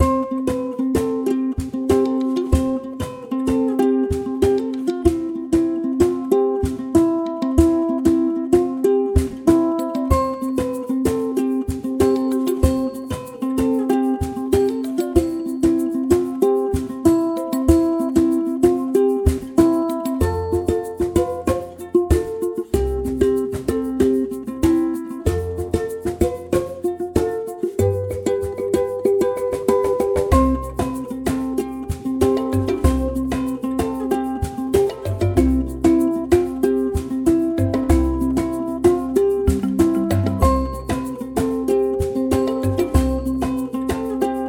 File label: Cute and Playful, background music